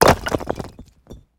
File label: building fall small; tanks; War Thunder